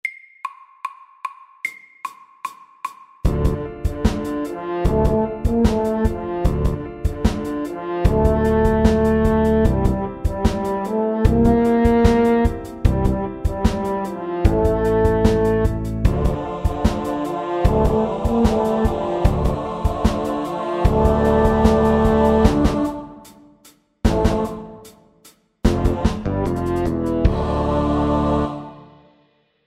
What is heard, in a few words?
A minor rap FORT